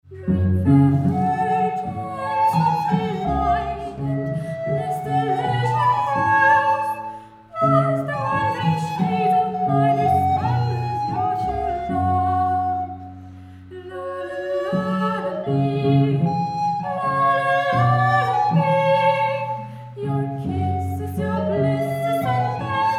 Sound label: Trio; Aeole